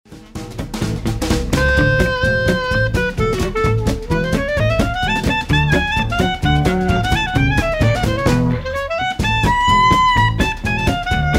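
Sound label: clarinet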